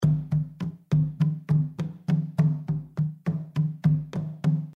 drummers spin Loop; tiki-beats; eyecon

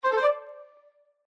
win; Enchanted Prince 2; Eyecon

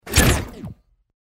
you won enter sfx; Fondant Favourites StarTrail; Eyecon

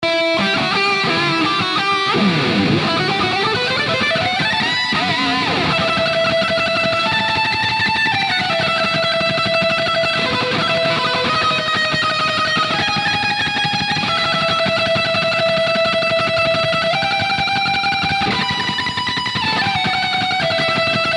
general Music layer; Champions of Valhalla

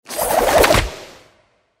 big win paper; Hot Off The Press

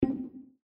selector unclick feature; Fluffy Favourites Mix 'n' Win

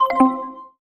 stake down; Clover Rollover 2

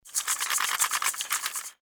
symbol Anim; lucha Rumble

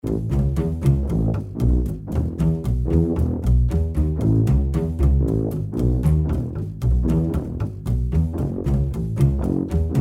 Music bass Fast; Lucha Rumble

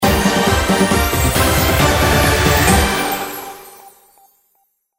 feature fanfare; Hot Off The Press; Eyecon

